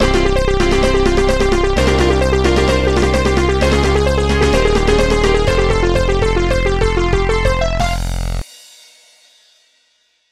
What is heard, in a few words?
Fanfare MIDI; Rareware